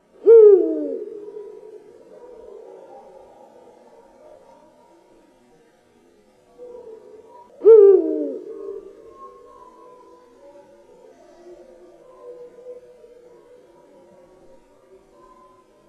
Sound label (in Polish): puchacz, ptaki